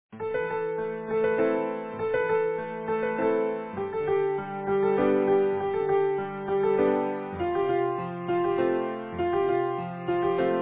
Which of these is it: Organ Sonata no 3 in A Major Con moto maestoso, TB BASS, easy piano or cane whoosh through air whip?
easy piano